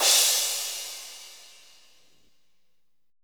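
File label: ROCK crash; POP-ROCK1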